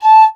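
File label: piccolo staccato A4; Flutes; DirectWave; Varazdin Orchestral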